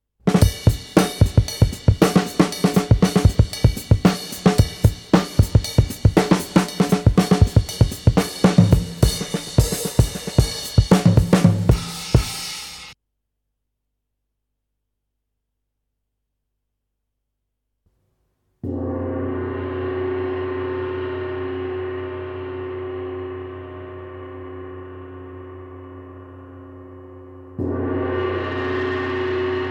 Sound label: music community groove rock